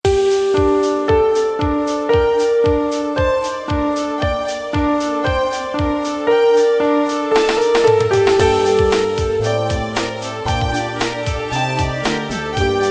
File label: ringtone